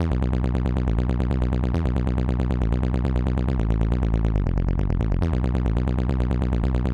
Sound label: Techno Bass Bbmin; 138; Dance DJ; Digidesign; Pro Tools; Pro Tools Essential Loops